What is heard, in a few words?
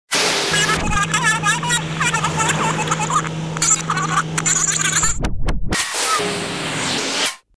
Chatter rumble, Stabs & Bursts; Best Of N2O Library